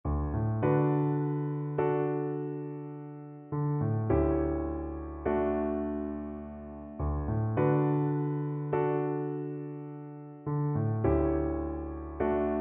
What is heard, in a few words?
Cello